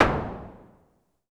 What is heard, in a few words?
Drum X Clap; Pearl Drum-X